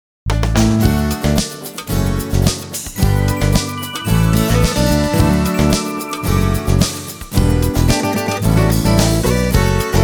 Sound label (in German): telefonansagen musik